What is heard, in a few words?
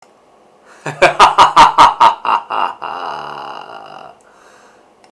haha